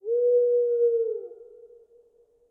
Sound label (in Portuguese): coruja